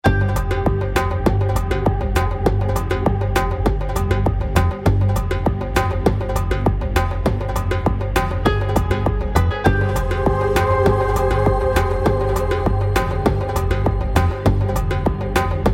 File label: electro